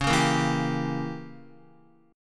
Db Diminished 7th, Guitar; strummed; JGuitar

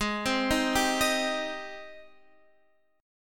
Ab Major 7th Ab down Guitar Drop D; AbM7 arpeggiated

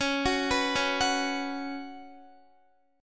Db 7th Suspended 4th Db down Guitar; arpeggiated

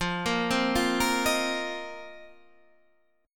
Bb Suspended 2nd Suspended 4th F

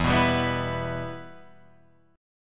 E-Minor, Guitar, Open F; strummed; JGuitar

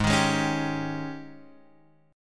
Ab Major 7th Suspended 4th Ab down; strummed; JGuitar

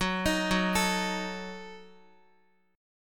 F sharp Minor 6th, Guitar; F#m6 arpeggiated

